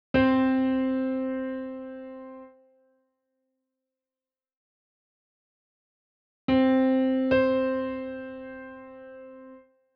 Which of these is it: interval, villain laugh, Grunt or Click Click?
interval